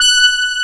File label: SYNTHESIZER, ANA HORN; AKAI S6000 CD-ROM - Volume 1